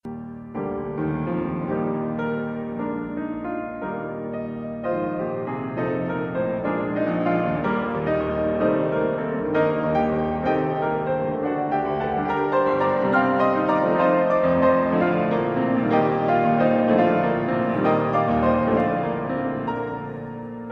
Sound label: Cappriccio in g minor; 2011 worship conference music